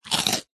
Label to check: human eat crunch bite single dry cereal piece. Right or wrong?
Right